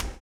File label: STOMPS; HOW IT IS; Spectrasonics; Vocal Planet; Blues Soul